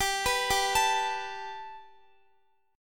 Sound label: G Major Add 9th Guitar, chord; Gadd9; arpeggiated; x,x,19,16,19,17